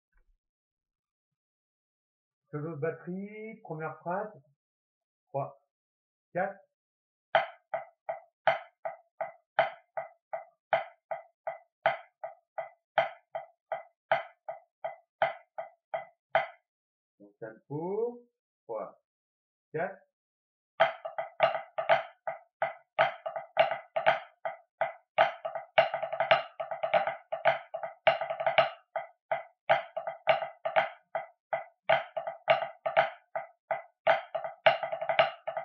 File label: solo